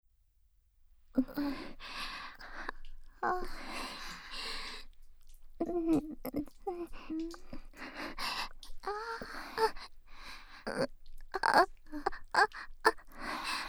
双子のW手マン&耳元喘ぎループトラック, ASMR

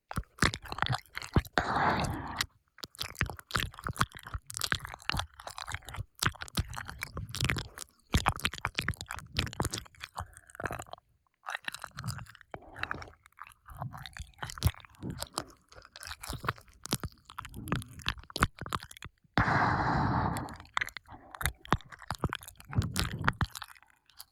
耳舐め（左）舔耳

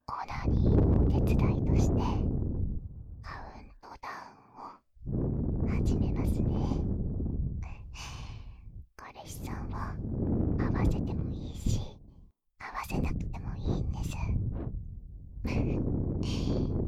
吐息

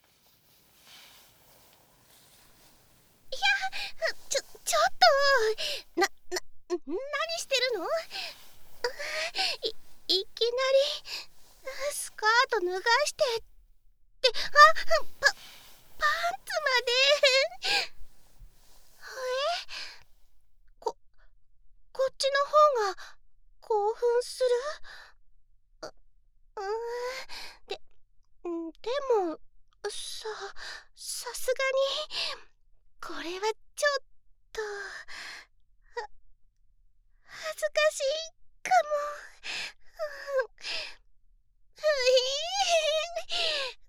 キスシーン; ASMR

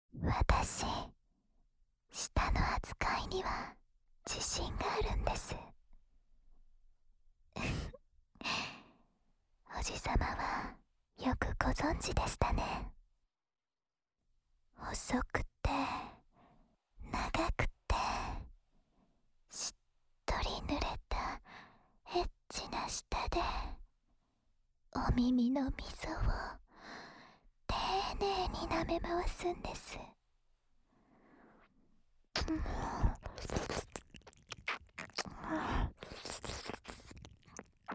とろけるような耳舐め（左耳）, ASMR; 友香

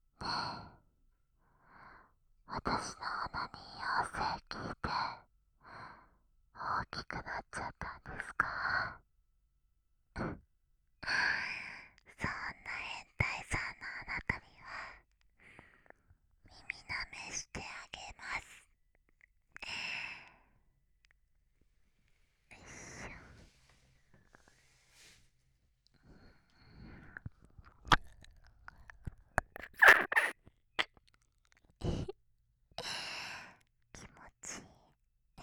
耳舐め バイノーラル, ASMR

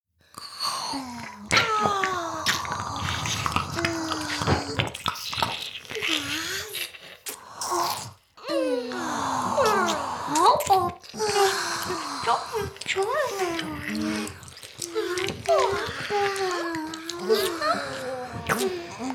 耳舐め＋フェラ Mix, ASMR; 魔法少女マスカリマッスル

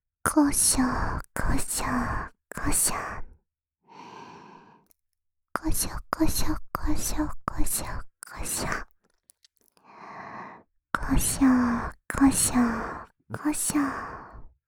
こしょこしょ, ASMR, 左耳

